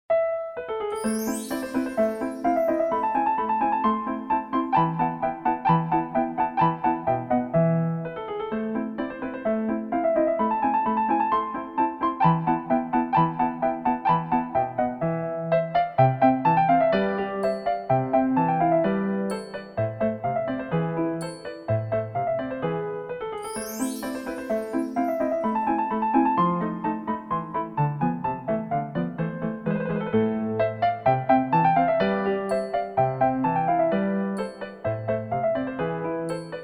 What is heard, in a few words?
piano sonata in A K331; Соната для фортепиано №11 - Вольфганг Амадей Моцарт